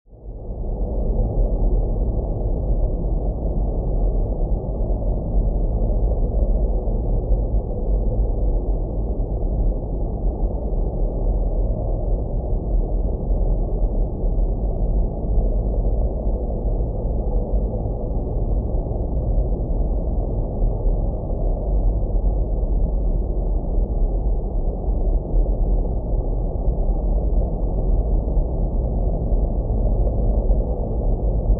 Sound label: Ambience Volcanic Planet. Ambient Windy Drone With Overlapping Tones That Moan